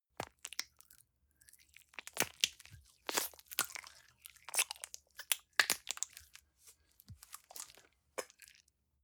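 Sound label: gel dispenser squish sequence, bathroom; haus garten; badezimmer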